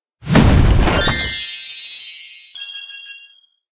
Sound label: bubble league box